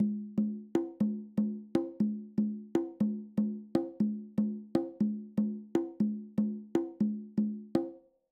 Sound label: Conga Pattern